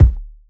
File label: Kick Afro House